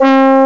707 brass section

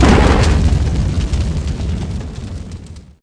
fire jet; Shadow Man

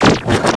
boxout

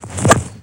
divot; Jack

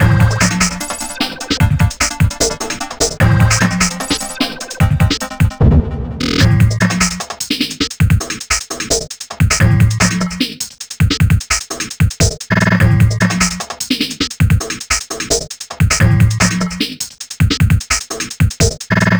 ODD DRUMS

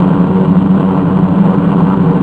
Taxi; BOMBER III™ (DEMO)

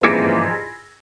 Twang

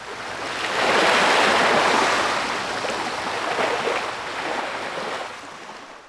wave lapping; DAoC

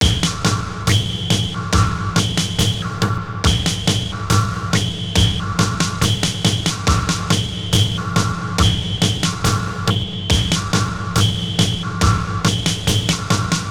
Bleep March

